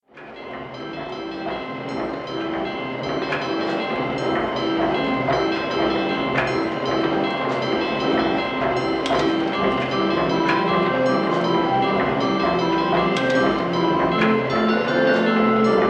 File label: Carousel; Silent Hill 3